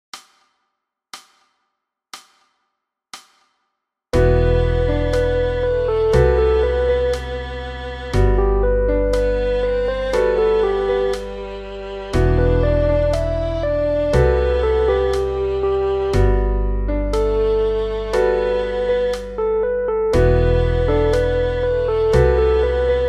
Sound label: chorus G 60